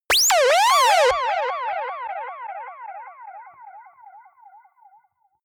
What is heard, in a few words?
warp breaching ufo